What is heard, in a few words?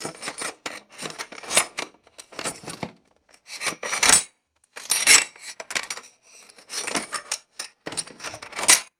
metal tools rummaging in a toolbox